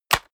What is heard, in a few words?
mechanical switch wood small push; industrie-wirtschaft; mechanik